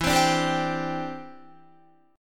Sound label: E Minor 7th Sharp 5th, down, Guitar; Em7#5; strummed